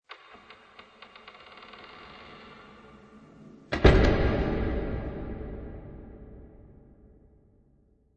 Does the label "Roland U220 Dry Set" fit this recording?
No